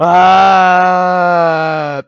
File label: falling; SM64 CO-OP; Bob's Burgers